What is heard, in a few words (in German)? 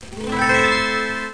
Buegel